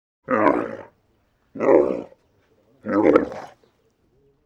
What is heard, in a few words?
Animals Birds; Sound Ideas 6003